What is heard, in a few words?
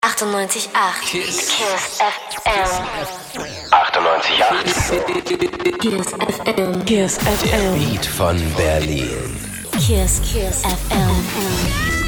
Jingles; KISSFM; 2009